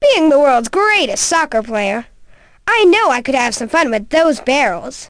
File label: interface; lego my style kindergarden